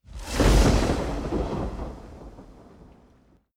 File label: Thunder Sweep